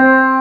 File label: SITAR C4; Big Fish Audio; Synth City